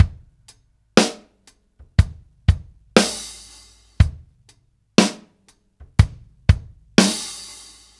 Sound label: Ballad Loop, drum; Laid Back; 70's Ballad; Sonic Foundry (Sony Creative Software); Loops & Samples Drum Tools